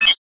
terminal, sci-fi; sci-fi sfx

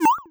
square miss; Square Waveform sfx

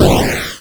explosion, Retro Sounds 32-Bit

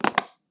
crumple

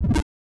bump, 8 bit sound effects

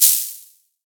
Shake Maracas; FREE SFX PACK VOL 2

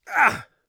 hit, Warrior Voice; Warrior Voice Pack

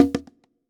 Bongo item pick; FREE SFX PACK VOL 2

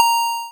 pickup, Retro Sounds 32-Bit

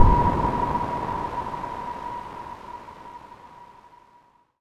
Retro Cinematic Long

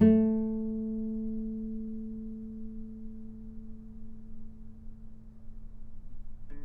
A3 - Pluck, novice cello

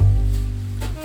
Upright Bass; BB 2HTC Samples Vol 4

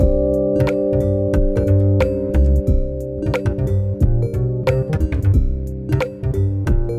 Rhodes Sus, Pads

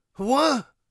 surprised, Warrior Voice; Warrior Voice Pack

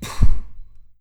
perish; abstract; Free Sound Assets

